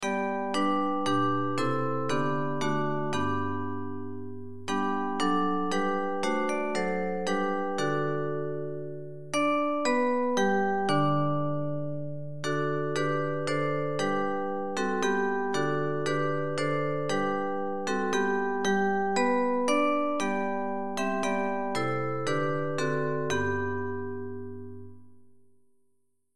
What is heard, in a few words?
Bells; Hymns